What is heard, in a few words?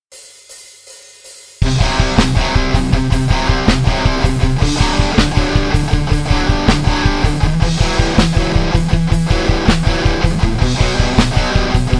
Rock Tracks